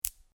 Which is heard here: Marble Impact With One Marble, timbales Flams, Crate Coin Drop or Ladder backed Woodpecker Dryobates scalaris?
Marble Impact With One Marble